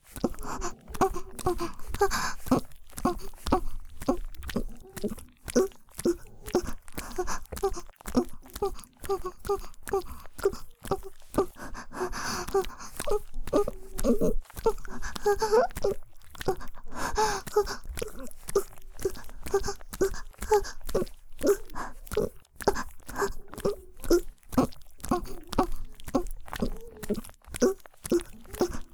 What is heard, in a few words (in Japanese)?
ギシアンループ, ASMR; 宮崎弁; 田舎の古宿ン娘の夜伽